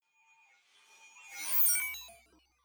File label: fly by drone digital